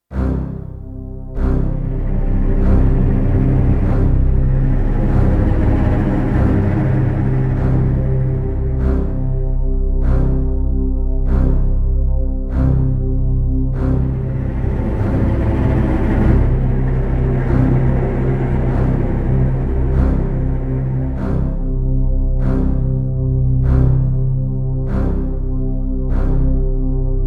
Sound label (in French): Catastrophe jingle; Jingles medley